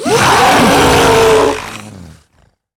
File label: enemy attack; horror game